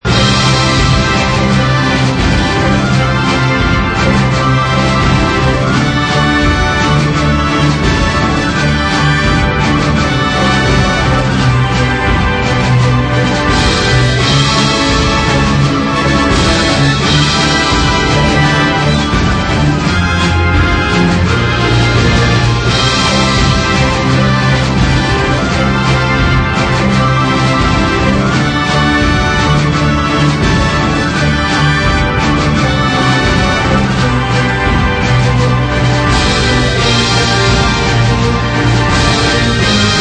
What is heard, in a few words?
BGM Victory; Clony Inc; Sky Dragon; Android